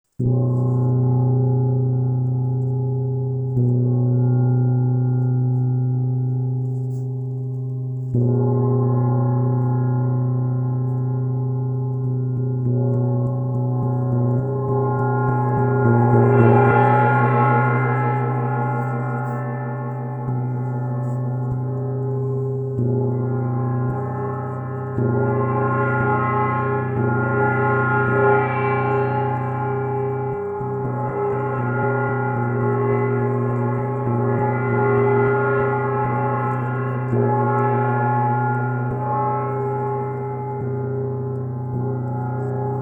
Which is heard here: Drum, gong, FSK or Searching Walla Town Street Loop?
gong